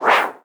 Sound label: Dunk Swish of Air